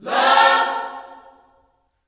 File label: LOVE CHORD, Gospel, Vocal; ILIO; Vocal Planet